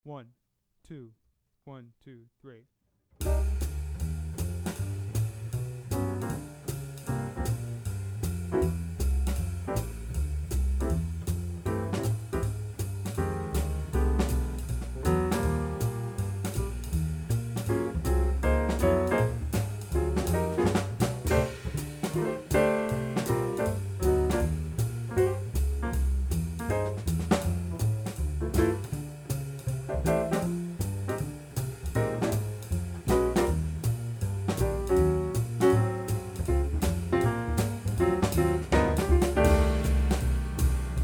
Play-Along, Saxophone; Jazz Conception For Saxophone; 21 Solo Etudes (Eb Saxo)

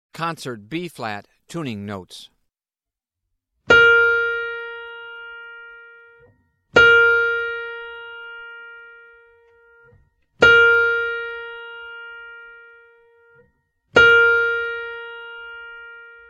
Tuning Notes; James „Jamey“ Aebersold Play-Alongs

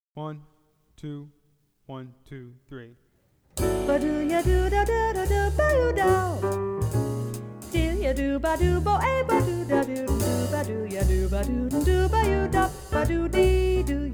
Saxophone; Jazz Conception For Saxophone; 21 Solo Etudes (Eb Saxo)

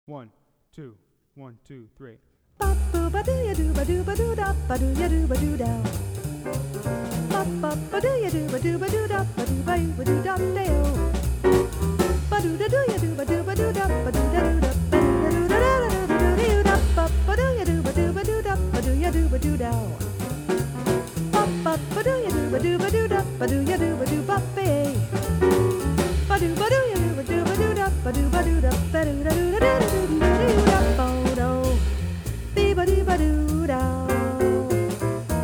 Bird Blues, Saxophone; Jazz Conception For Saxophone 21 Solo Etudes (Eb Saxo)

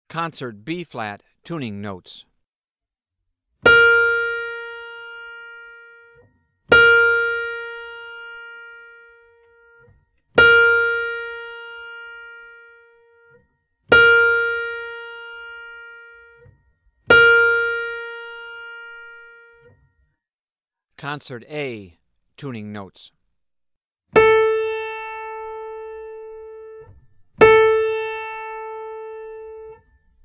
Tuning Notes; James „Jamey“ Aebersold Play-Alongs

